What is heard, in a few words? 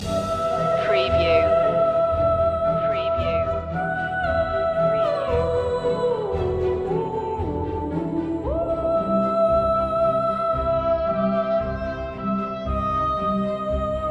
forming loop